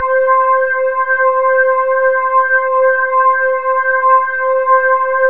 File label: MKS80, PADS; Masterbits; Vintage Voltage